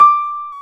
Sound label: PNO Grand Pno; Club-50 - Foundations Roland